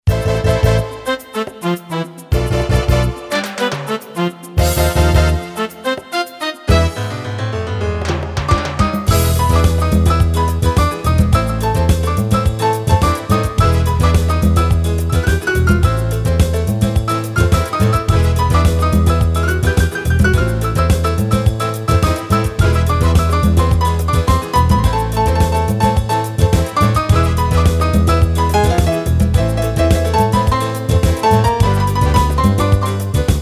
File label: Salsa, Yamaha PSR-550; Style